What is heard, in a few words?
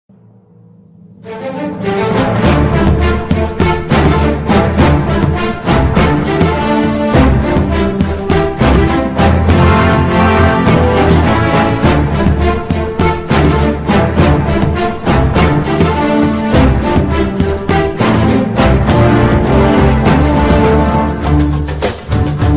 epic battle ringtone; one piece